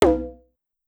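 Conga Hi Down Fast, Drum Machines Clavia DDrum-AT Pitch Envelope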